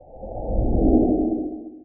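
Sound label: ambient swoosh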